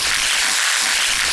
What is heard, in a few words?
skid normal